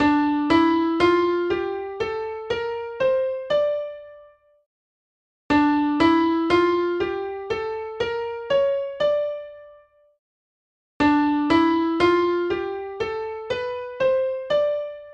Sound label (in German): Tonleitern; Scarboro Fair